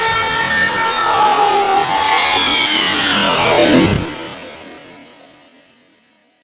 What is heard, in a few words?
jumpscare; the night shift; iggy funhouse demo